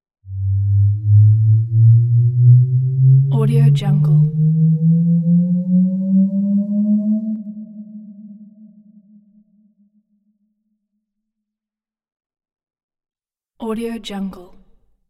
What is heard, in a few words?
energy charge